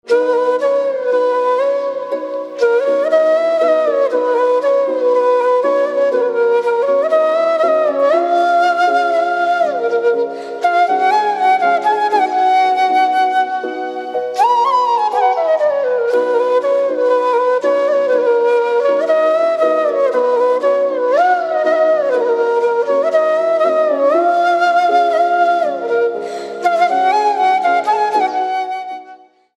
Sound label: Flute